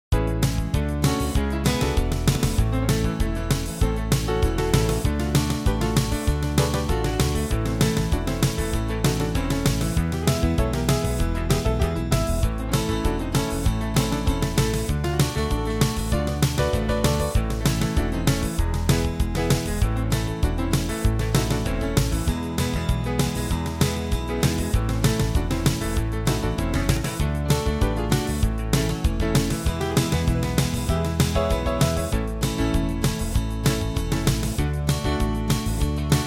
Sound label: cajun fiddling uptempo